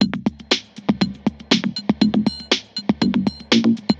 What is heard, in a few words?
Boombox Beat